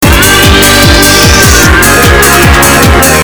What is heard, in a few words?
Loop